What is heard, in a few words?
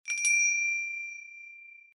message, notifications; Verykool; S700